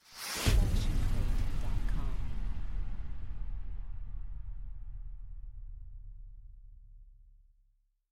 Cinematic Hit

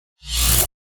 lightsword turning off; soundscrate

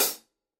hi hat 14 inch; soundscrate

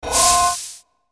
SciFi Machinery; SoundsCrate

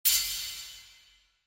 bright sword

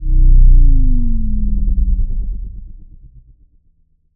synth fall; soundscrate

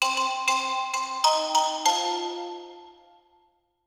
Xylophone Ident

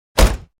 car door slam

